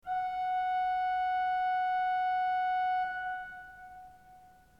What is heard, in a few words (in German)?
ton, orgel